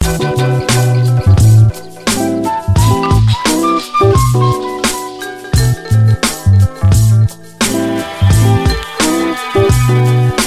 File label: beat